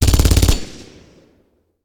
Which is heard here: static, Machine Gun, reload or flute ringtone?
Machine Gun